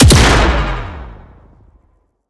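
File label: Sci weapon Shot Medium Gun Sharp Shooter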